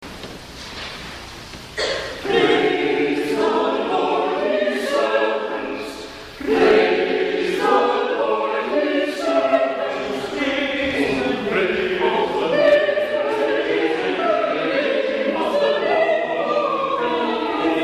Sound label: adult choir